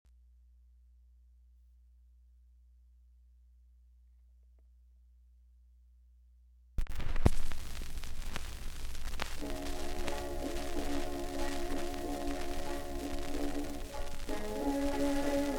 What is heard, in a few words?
Popular Jazz Archive 1920-1936